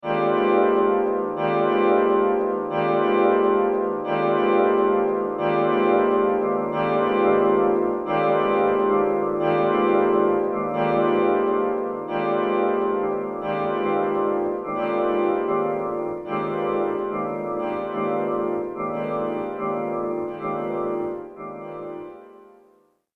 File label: piano paste